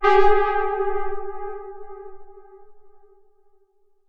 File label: Dark Chord; AMG - Prototype Drum & Bass